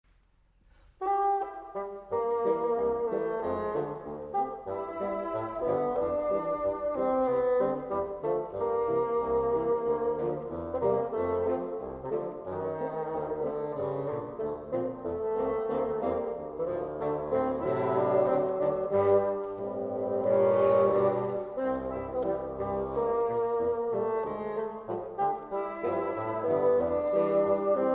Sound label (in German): Fagott foxtrott